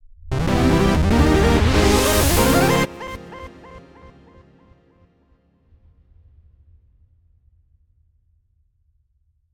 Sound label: UI Level clear